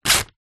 card plastic window toy car packet rip; foley; zapsplat

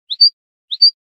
bird chirp generic; animals

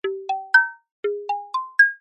cartoon musical expression mallets happy carefree; zapsplat

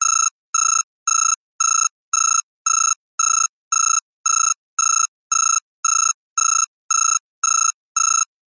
household alarm clock digital beeps; zapsplat

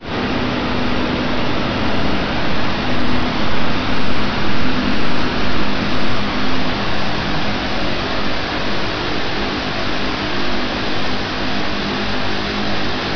sound design dark deep drone

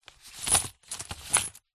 foley money coins movement in paper envelope

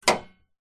car hood latch ping

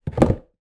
potty plastic down on floor, household

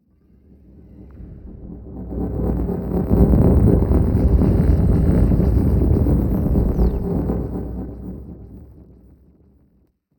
Just Transitions SciFi; soundbits

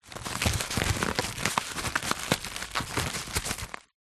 foley plastic bubble packaging cushion handling; zapsplat

